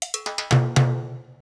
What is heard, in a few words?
tap